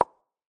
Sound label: pop; CrossyRoad